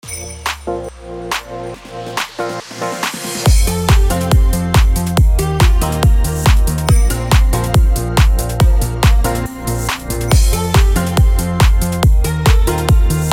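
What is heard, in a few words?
CHRISTMAS F major 140BPM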